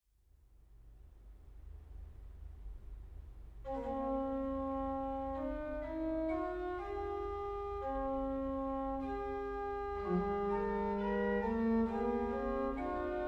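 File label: Fantasia, organ; Caimari; Mallorca; Santa Maria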